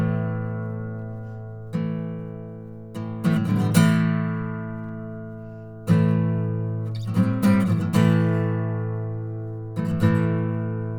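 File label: Guitar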